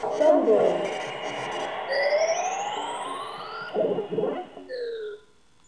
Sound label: fumble